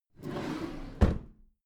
packed drawer smooth close regular

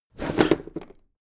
packed drawer smooth open heavy halfway

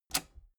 metal button robust spring push disable

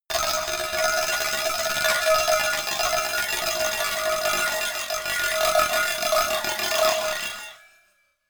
cowbell tremolo clank

